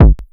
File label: grind, EMU XL-7